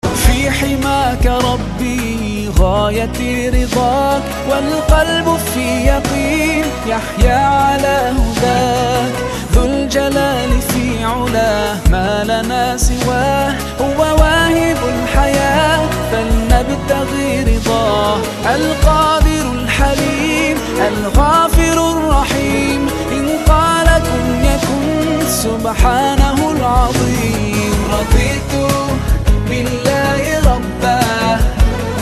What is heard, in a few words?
(Arabic Version - Bonus Track)